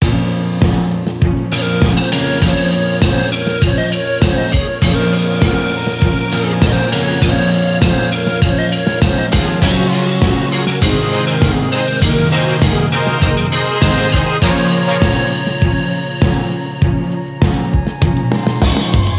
rusty town loop; ゆめ2っき

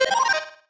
marimba dice; ゆめ2っき

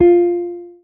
marimba guitar; ゆめ2っき

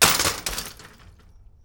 bike fall

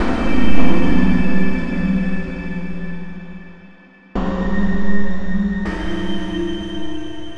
Industry; ゆめ2っき

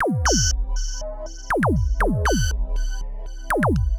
electro room; ゆめ2っき

